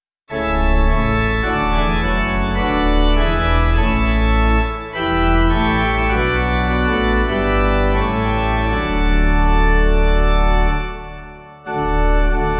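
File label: pipe organ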